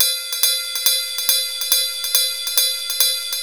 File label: Addon Loop, Drum Loops 140bpm, Perc Loops